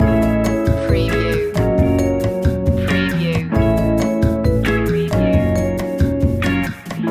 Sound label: lounge lizard loop